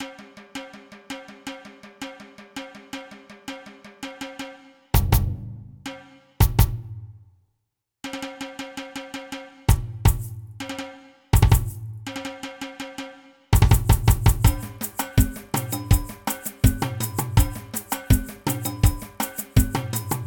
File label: Samba Reggae; grid